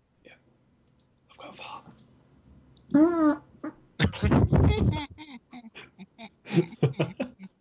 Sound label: fart